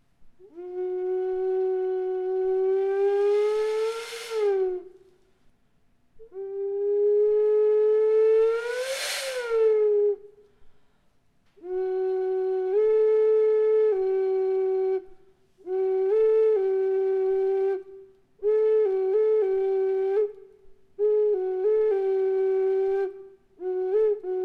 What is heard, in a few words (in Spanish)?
flauta globular